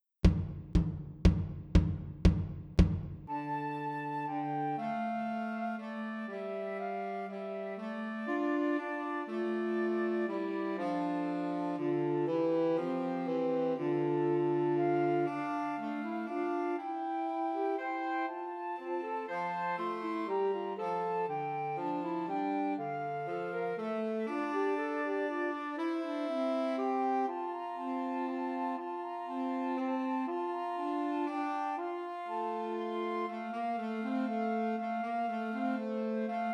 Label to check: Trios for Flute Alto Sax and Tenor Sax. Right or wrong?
Right